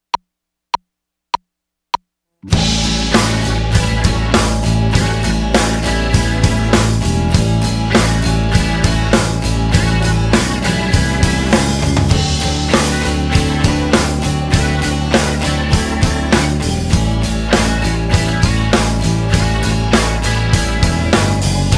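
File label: Straight Eight Blues without Guitar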